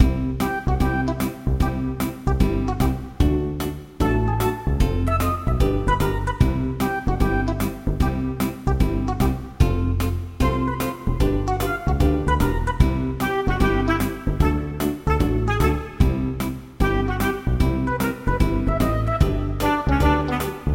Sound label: Bgm Game Music